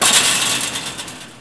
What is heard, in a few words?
button; blackops